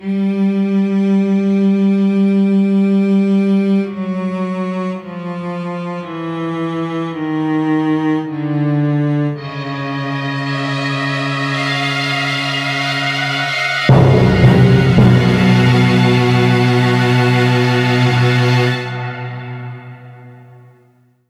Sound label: Suspense Build Reveal